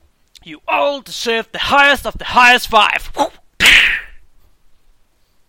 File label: high five